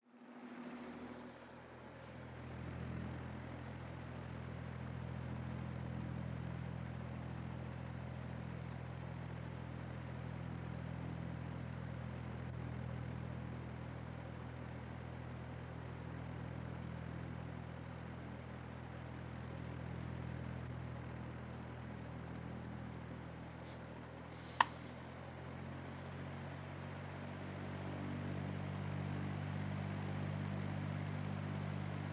Standing Fan

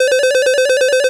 8 Bit Phone

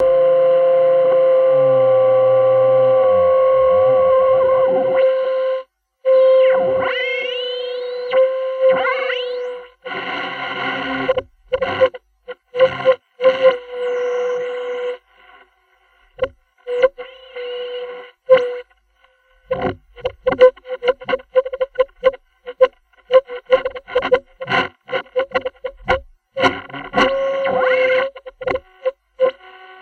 Radio Am Search

